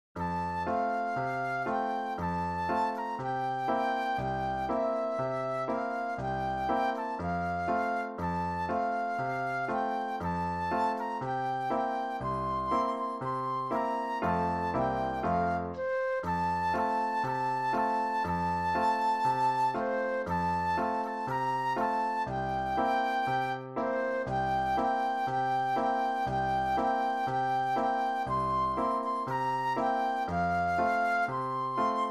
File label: flute solo